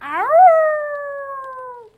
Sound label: wouh